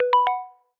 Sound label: New World, notifications; Honor 600 Pro China